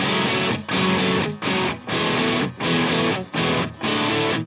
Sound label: chorus gtrs